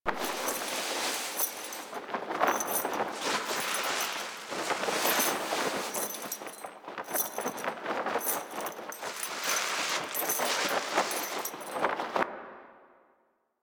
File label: Foley Loop; Bombastine; Warframe; Duviri